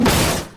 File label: desk slam